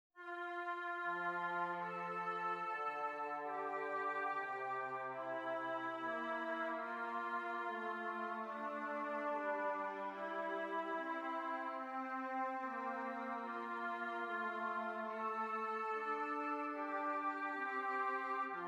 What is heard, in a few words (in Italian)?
esercizi di contrappunto semplice A due voci; 4 gattung; Ronge